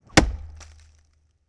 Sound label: Tomahawk Throw & Impact; Weapons & Firearms; Sony Pictures Sound Effects Series